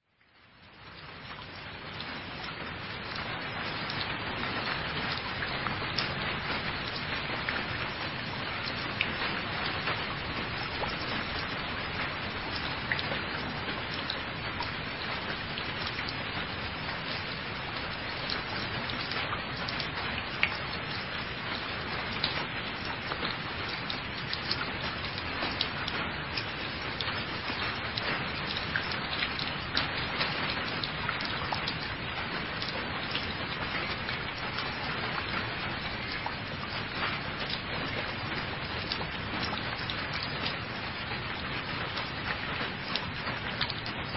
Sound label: rain